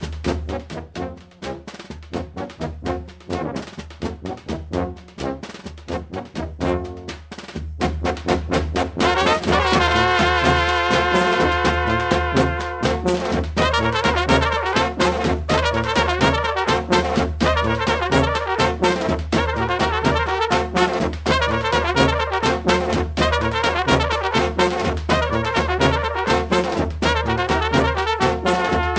Trubaci

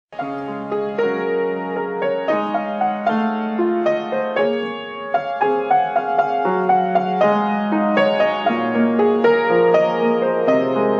Gong Piano; Gong Yoo Piano

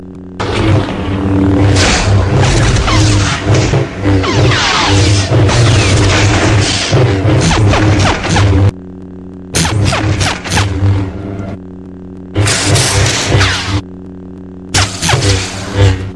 Lightsaber Fight

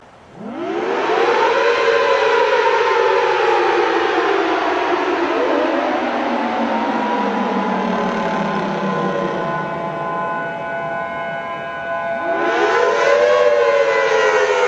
Growl; T-bolt 1000t; meloboom

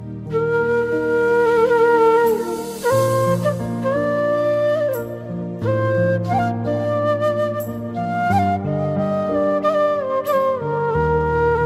Flute

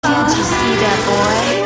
Siren

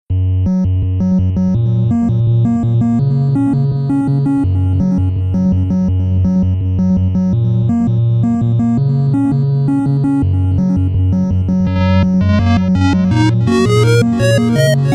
8 bit; Genesis

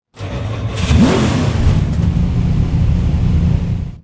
Gt40 Startup

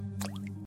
Single Rain Drop Sms

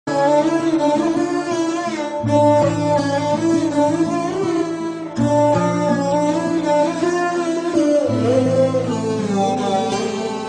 Sad Music

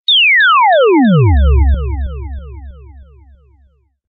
Beep Down

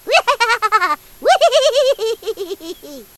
Laughing Laughter

